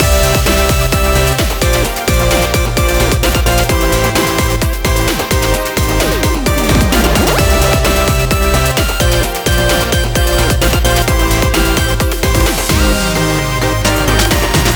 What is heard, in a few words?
interesting beat